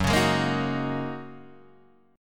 F sharp 6th Add 9th Guitar Open D, chord

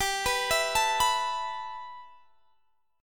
G 6th Add 9th, Guitar, Standard; G6add9 arpeggiated; JGuitar

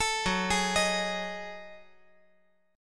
Gb Minor 6th Add 9th Gb down

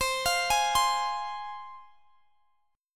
C Suspended 4th Sharp 5th C Guitar Drop D; down; chord shape

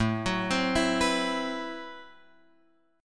A Minor 6th Add 9th, Guitar, Open F; Am6add9 arpeggiated; x,4,6,7,7,6; JGuitar

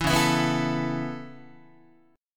C sharp Minor 7th Sharp 5th C sharp Guitar; C#m7#5; strummed; JGuitar

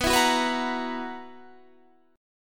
B Major 7th Suspended 4th B down Guitar Standard; BM7sus4; strummed

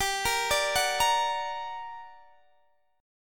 G Minor Major 7th, down, Guitar, Open D

